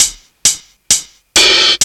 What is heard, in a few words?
HH LOOP; USB Soundscan vol.27